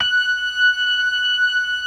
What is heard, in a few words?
LOW MAN, LOWER MANUAL, Organ